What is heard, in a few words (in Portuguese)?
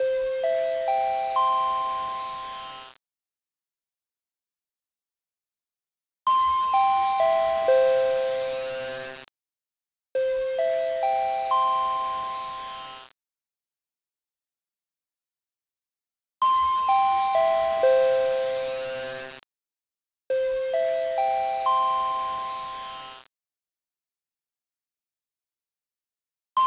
hipermercado Atencao Loop